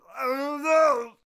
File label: Divine Arbiter Death Vocals